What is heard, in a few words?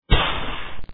fizzle; demolition 2